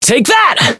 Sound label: Voice attack; curio